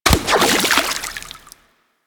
Fishing Spear Water Impact; Warframe; Weapons; Eidolon Fishing Spears

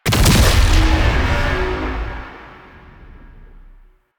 Fuel Projectile Explosion Super; Warframe; Jade Shadows; Ascension

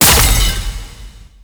Light Orb Hit; Warframe; Eximus; JadeLight

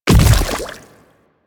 Infested Splitter Land Water, footsteps; Warframe; Grey Strain

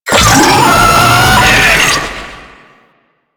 Infested Attack; Enemies; Vocals; Warframe; Proto Infested; Brachiolyst

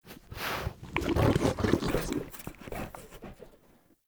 Wolf Idle Shake; Animals; Vocals

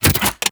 Briefcase Launcher Reload Passive; Warframe; Weapons; Corpus

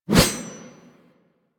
Sword Swipe, Melee Whooshes; Warframe; Weapons

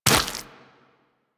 Land Flesh, Footsteps; Warframe; Tenno

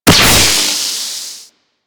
Beam Fire; Super Moa Beam

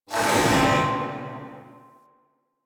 Galleon Electric Amb; Ambience; Warframe; Grineer Galleon

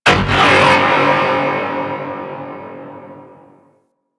Creature Robotic; Ambience; Warframe; Corpus Outpost

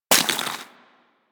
Bullet Impact Debris Snow

